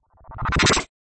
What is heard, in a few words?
super ready; nickelodeon basketball stars 2015